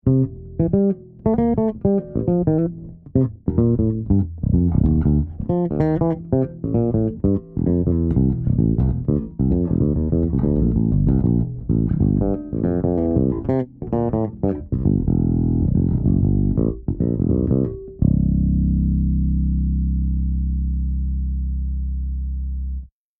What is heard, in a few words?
bass